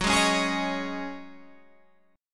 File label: C sharp Suspended 2nd Suspended 4th F sharp, chord